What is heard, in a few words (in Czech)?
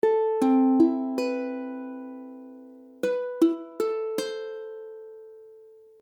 Amadd9, akordy ukulele